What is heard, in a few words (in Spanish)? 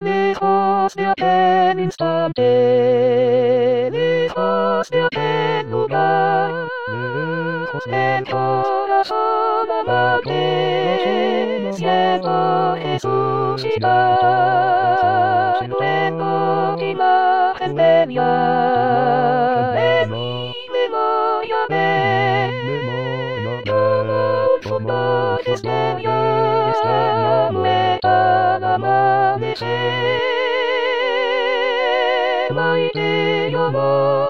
voz; Coro "Con dos Bemoles"